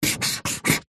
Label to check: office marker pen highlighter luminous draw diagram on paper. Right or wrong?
Right